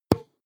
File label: tennis ball hit